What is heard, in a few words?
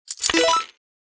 Slot machine credit insert game play